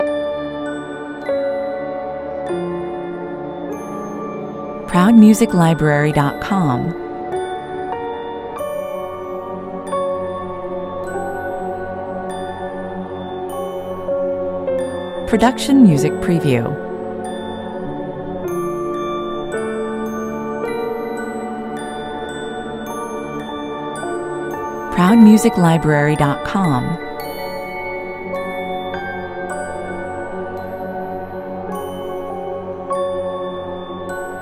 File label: evil music box long looping